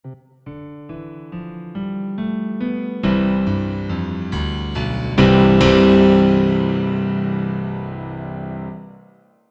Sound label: NI AKOUSTIK PIANO